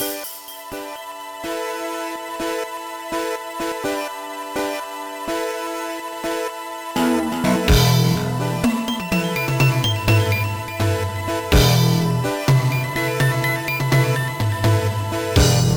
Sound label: rythm piano; Trackers; Modland